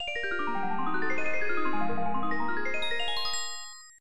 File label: Unused Jingle; Satellaview BS-X